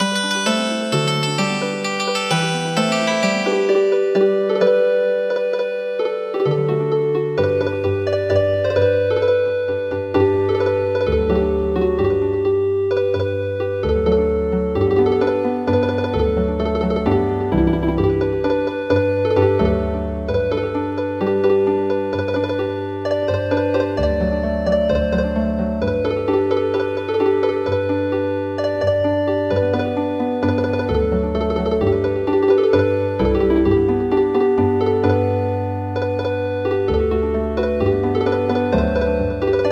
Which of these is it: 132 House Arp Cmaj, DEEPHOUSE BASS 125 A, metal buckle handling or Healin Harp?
Healin Harp